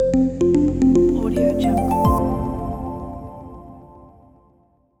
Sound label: corporate logo